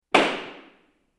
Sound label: wood hammer gavel